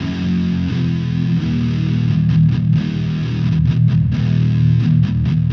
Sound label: GTR ELEC, Attack